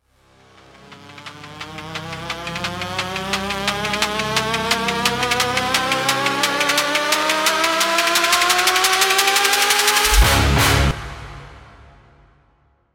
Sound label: case open long